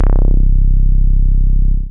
BAS MINI, MOOG; Sound & Vision - Gigapack II CD 1

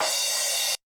kawai k1 CRASH; wavetables

